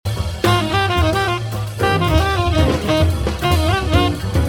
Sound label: solo phrasing over barline